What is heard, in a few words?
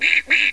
duck